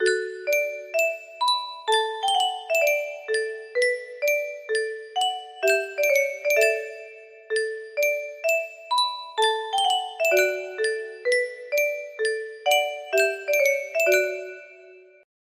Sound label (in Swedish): yunsheng speldosa; bamse